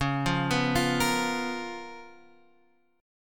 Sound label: C sharp 13th C sharp, Guitar, Half Step Down; C#13